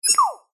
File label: science fiction computer or robot beep short single